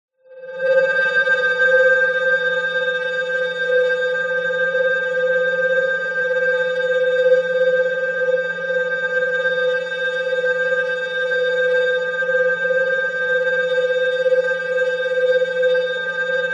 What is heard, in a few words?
science fiction machine howling spinning high pitched